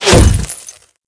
Glass Unbreakable Impact

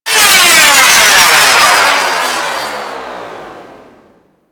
Orbital Strike Missile; Warframe; Vay Hek; Vay Hek Orbital Strike Drone

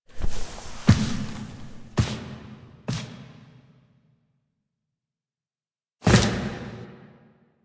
Foley; Tenno; Warframe; The New War; Fight Intro

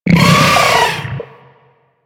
Arachnoid Berserk Vocal Attack; Enemies; Vocals; Warframe; Corpus; Arachnoid Hunger

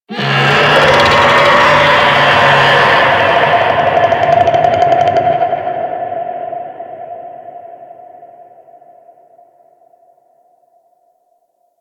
Infested Golem Vocal Attack Short; Warframe

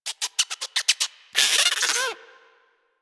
Forest Rodent Vocal Captured Idle; Warframe; Hunting Critters

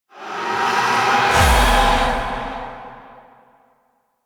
Void Mode Switch; Weapons; Warframe; Duviri; Incarnon Adapters

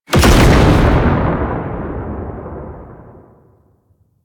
Alchemy Transmuter Despawn; Warframe; Entrati

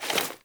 Foley Action Default Melee Swipe

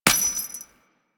Step Default, footsteps; Warframe; Grineer Royal Jester; Foley

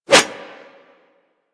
Claw Swipe; Manic; Attack

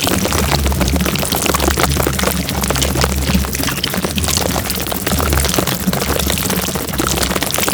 Infested Bone Blade Mortar Proj Loop; Warframe

